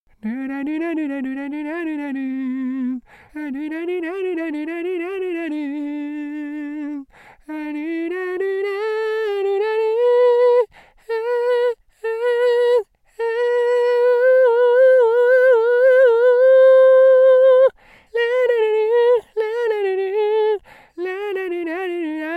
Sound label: lalala